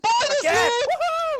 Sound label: bonus loot